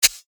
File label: ignition; maoudamashii